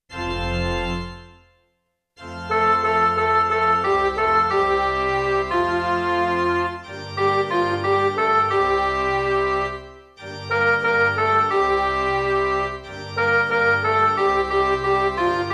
divine office lords prayer midi

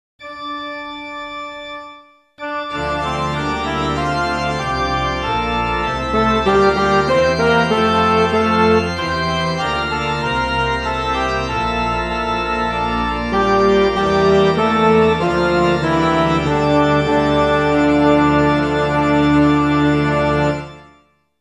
penitential act form 1 midi

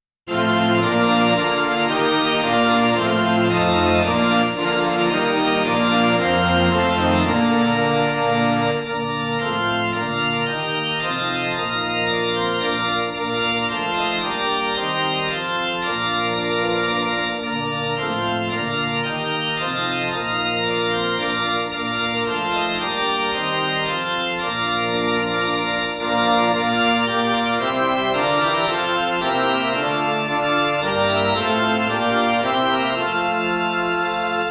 midi

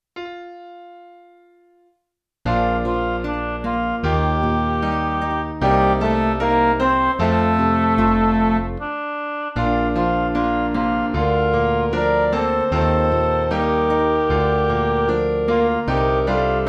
midi

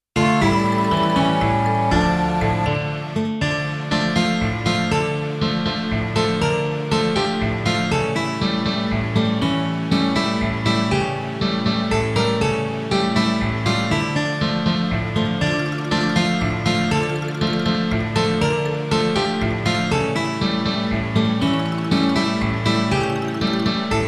midi

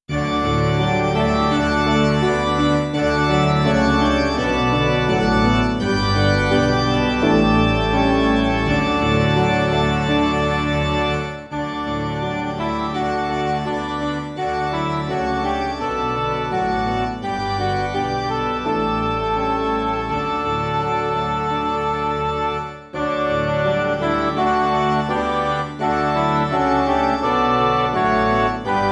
alleluia acclamation christmas midi

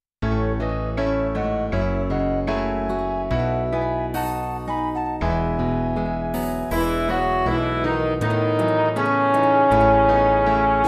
lords prayer midi

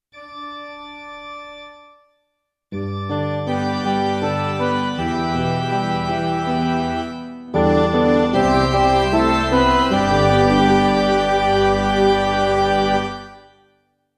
mass gospel affirmation midi

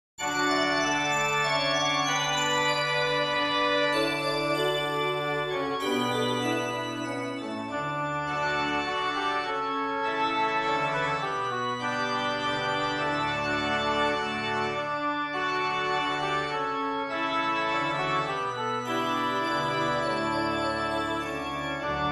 all bells in paradise midi

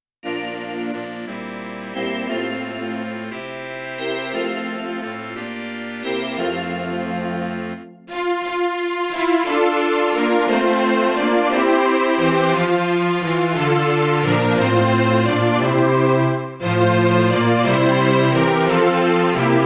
midi

